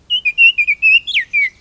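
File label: oriole baltimore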